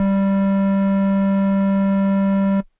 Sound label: Air Bass Kawaii G2; keys